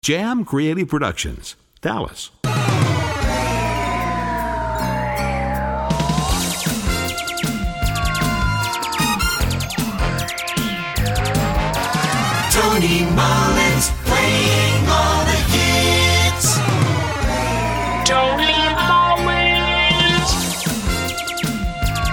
jingle

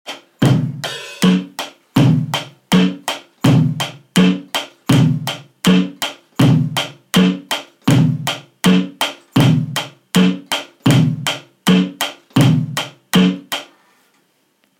electric drums

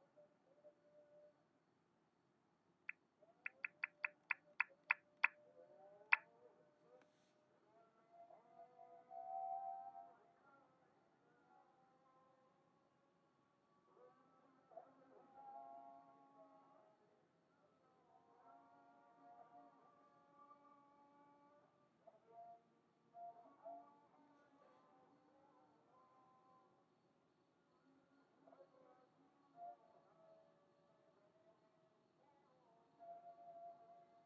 muezzin heard in Marrakech

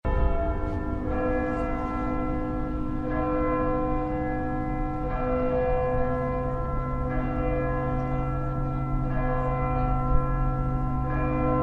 basilica clock striking Montreal

